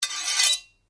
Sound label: Blade Scrape Flick, Sword; Foley sounds for melee weapons; Combat